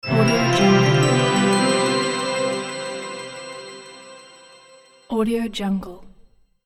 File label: Subtle Logo Reveal